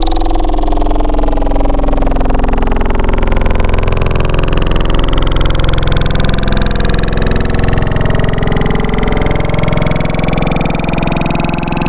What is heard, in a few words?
Pinched, Wavetables; Synthetic's Serum Collection; Cymatics - Nuclear for Xfer Serum Wavetables